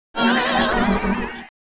Grito; Mismagius; Gritos de Pokémon de la cuarta generación

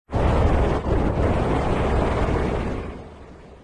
Grito; Urshifu fluido Gigamax

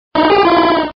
Grito; Sudowoodo

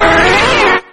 Grito; Fearow; sexta generación

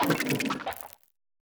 Grito

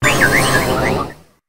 Grito; Toedscruel

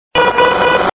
"Grito de Mantine", Pokémon

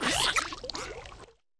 Grito de Quaxwell; Gritos de Pokémon de la novena generación

